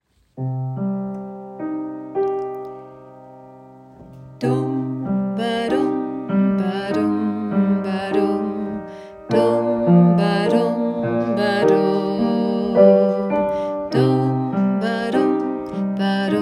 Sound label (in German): Lasst uns feiern Cm